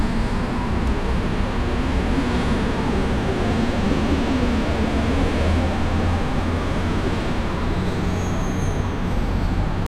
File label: commotion